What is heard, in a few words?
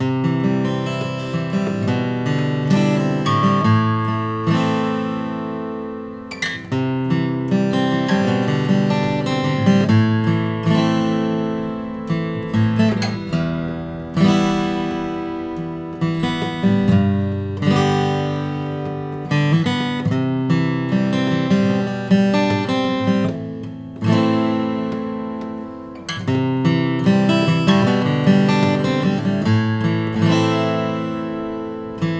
froggy bottom h12c deluxe rosewood